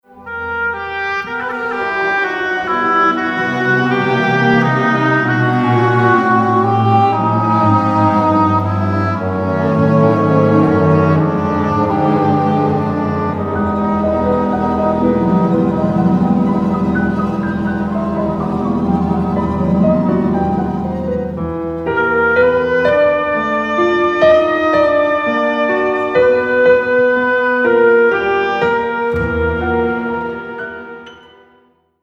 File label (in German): klavierkonzert 2 satz